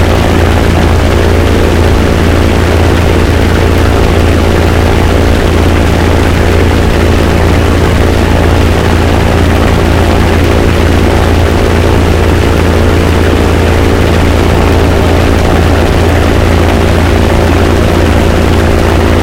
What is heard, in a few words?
engine tvd v 2300rpm; War Thunder